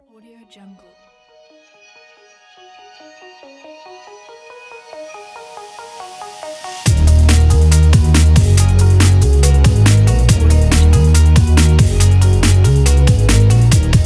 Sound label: light break beat